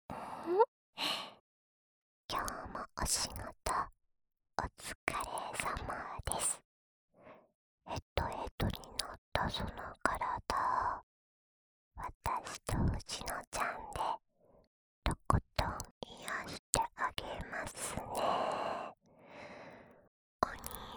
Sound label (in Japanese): 安眠用両耳ふーふーループ